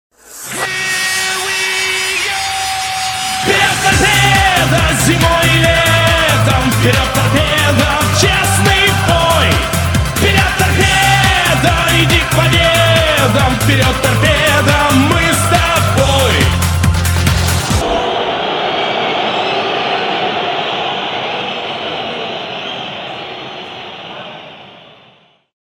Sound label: Torpedo, Fans